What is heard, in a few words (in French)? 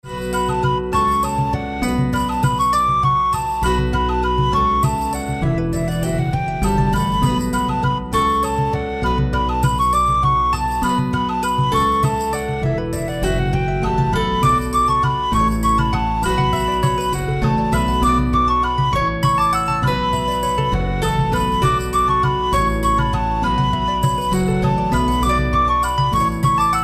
foret elfic